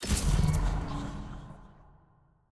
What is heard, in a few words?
UI Exit Appear